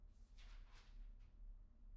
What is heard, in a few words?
pageturn; IEEE AASP